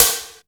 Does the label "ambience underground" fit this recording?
No